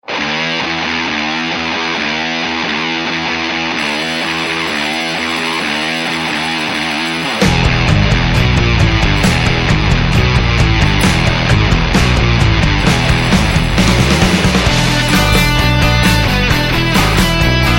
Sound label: Buzz Saw Effect